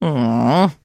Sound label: trader sigh; 七日杀; 商人杰恩